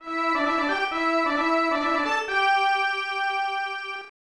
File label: Minor Victory; Yatterman; SNES